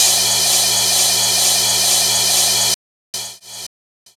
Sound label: Ride set, Drum and Bass